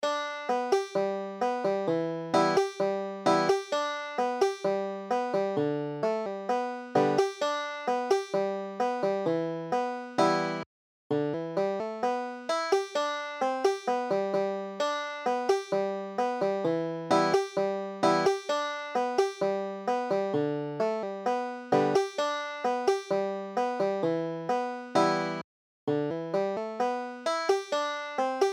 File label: banjo